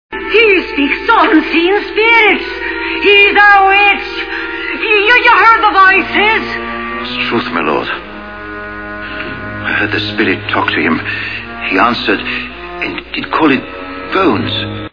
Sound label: witch